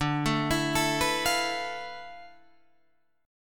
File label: D Minor 6th Add 9th D, Guitar, Drop D